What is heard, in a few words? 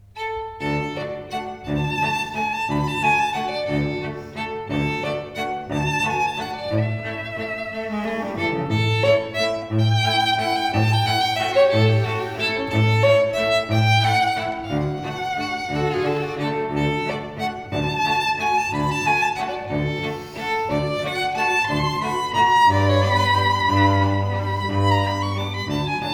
Waltz